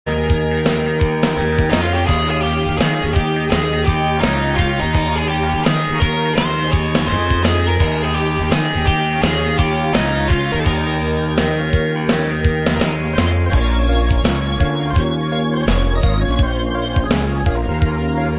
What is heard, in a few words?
emulated guitar mix